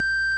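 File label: ORGAN Vox G, Vox Jaguar, Continental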